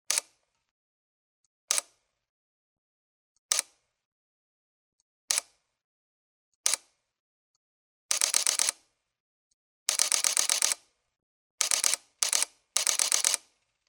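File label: Sony A77, camera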